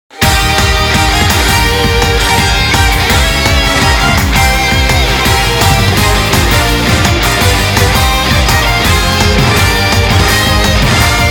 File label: (Off vocal ver.)